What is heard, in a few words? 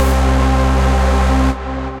Noisyone, Synth Lead; Ableton; Factory Packs; Build and Drop